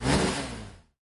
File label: Turbo Boost Flame Engine; lethal company